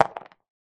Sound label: foley 2 dice throw on game board